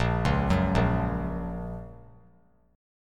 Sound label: Bb Minor Sharp 5th; arpeggiated; JGuitar